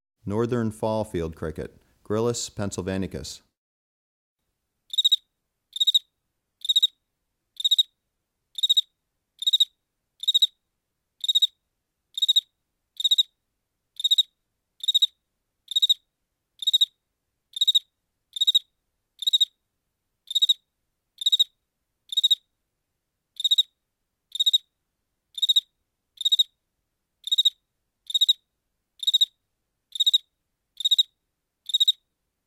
Northern Fall Field Cricket, Gryllus pennsylvanicus; Crickets and Katydids